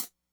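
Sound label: hihat, Breakdance 172 B minor; Big Fish Audio; Hardcore Breakbeat Action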